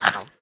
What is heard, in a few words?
Nom